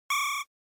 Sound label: Shooter Shoot